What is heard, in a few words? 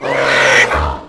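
parasite death; lmctf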